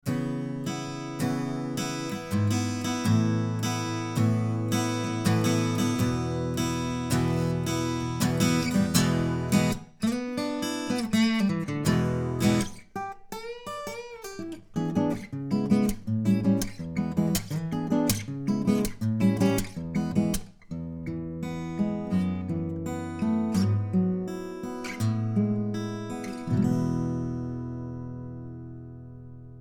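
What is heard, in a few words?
Acoustic Guitar Omni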